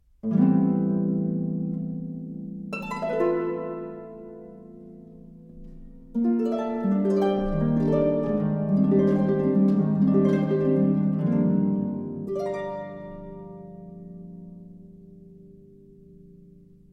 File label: music